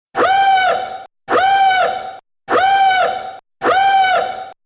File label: level up; dg deathrun-2021